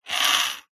leisure poker dealer button chip scrape rim edge on concrete